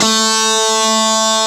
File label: D3 GUITAR; AKAI S6000 CD-ROM - Volume 1; JOHNS GT